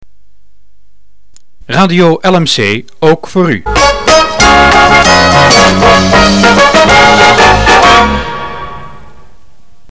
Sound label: jingle; LMC